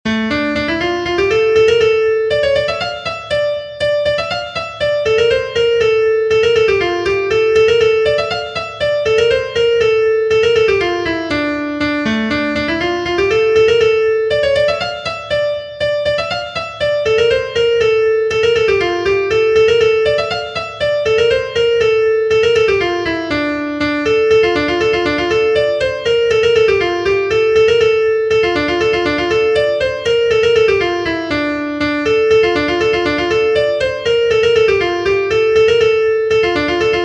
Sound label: schottis